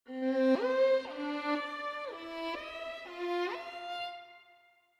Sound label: portamento